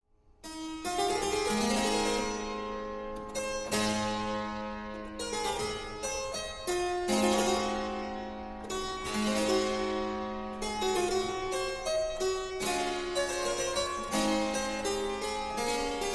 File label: partita b flat major